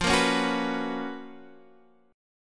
F sharp Major 11th F

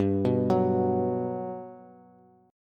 G Major 7th G down Bass; GM7 arpeggiated

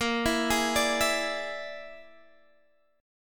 A sharp 7th Flat 5th A sharp, Guitar Standard; A#7b5 arpeggiated; JGuitar

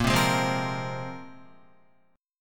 Bb 7th Suspended 2nd Bb down Guitar; Bb7sus2; strummed; JGuitar

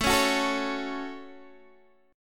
A 6th, Guitar Standard, chord; strummed

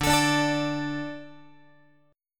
D 5th D, down, Guitar; D5 strummed; x,10,12,12,13,13; JGuitar

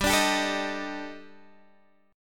Eb Major 7th Flat 5th

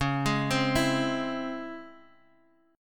C sharp Minor Major 7th, Guitar, Half Step Down

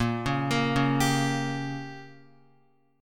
Bb Minor 6th, Guitar; arpeggiated; JGuitar

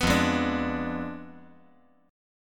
F Diminished 7th F down

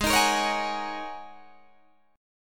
Ab 9th Suspended 4th Ab down Guitar Open G

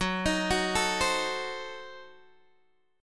Gb Diminished 7th Gb, Guitar Open D; chordshape; down

